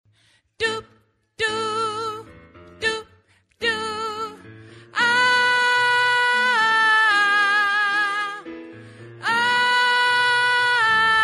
om jag snubblat efter vägen tenor, stammor

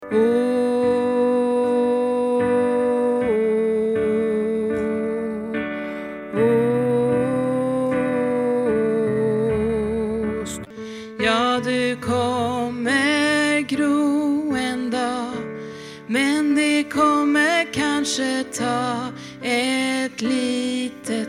starkare alt, stammor

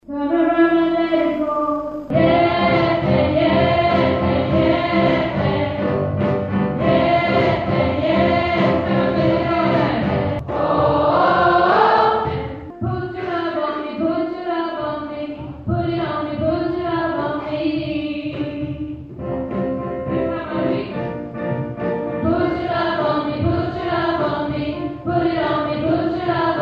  alt, stammor

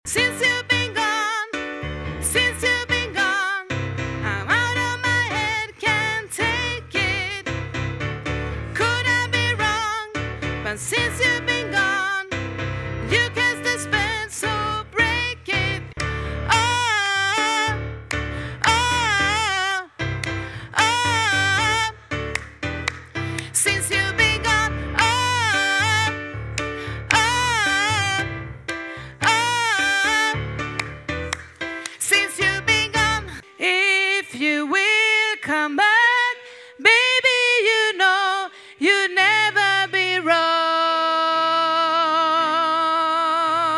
sopran, stammor

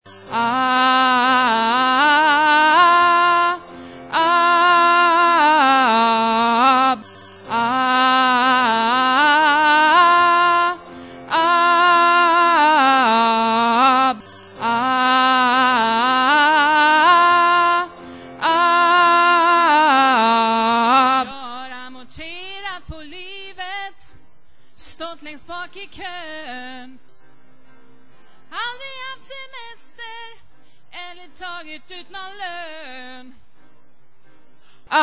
vingar bas, stämmor

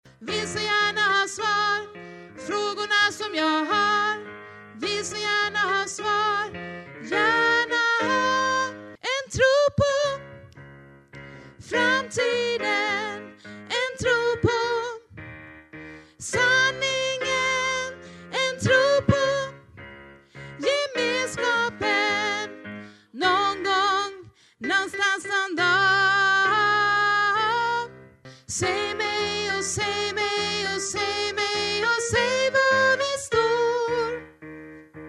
sopran; stammor

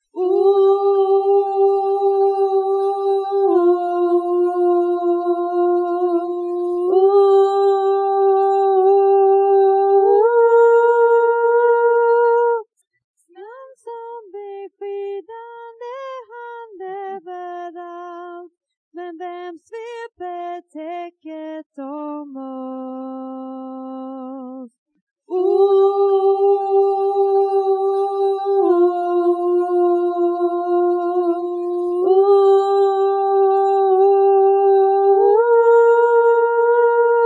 sopran; stammor

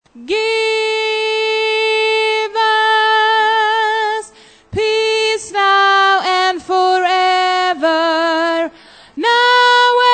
sopran 2

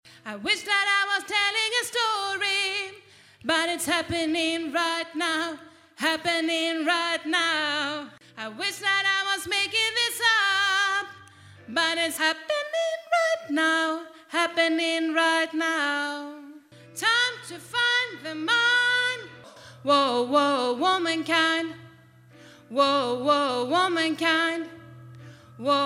womankind sopran, stämmor

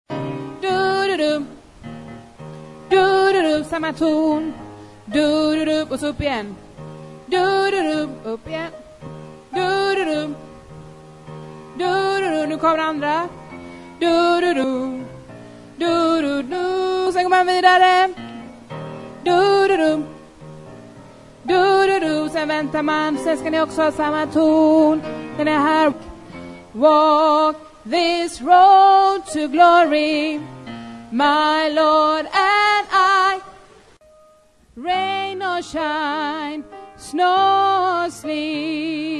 walk this road to glory tenor, stämmor

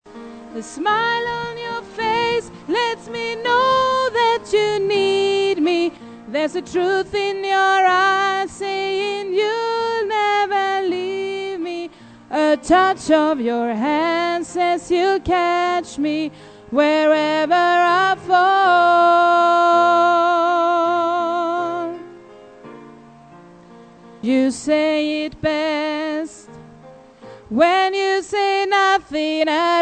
sopran

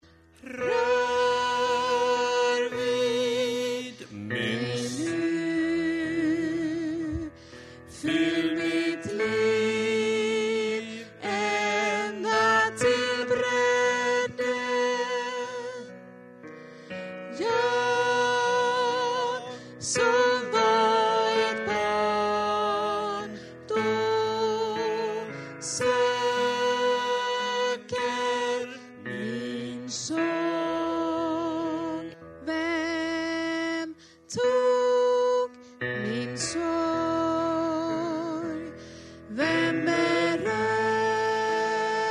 bas

